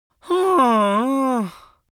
cartoon vocal attention seeking disappointed boy loud